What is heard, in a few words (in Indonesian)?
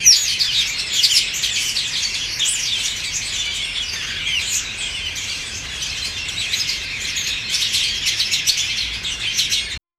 suara kawanan burung yang; disaster